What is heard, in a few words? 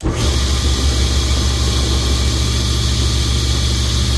Table Saw Strt Run